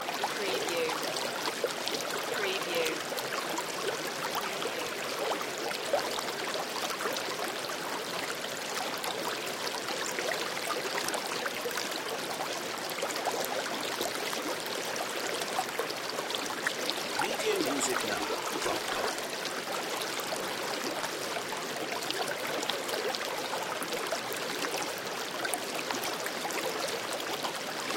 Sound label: Gentle River Loop